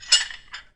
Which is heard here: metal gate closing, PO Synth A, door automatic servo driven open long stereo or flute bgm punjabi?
metal gate closing